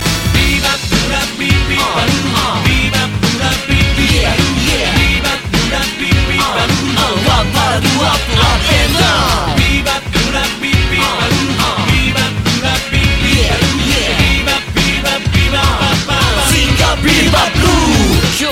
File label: Rap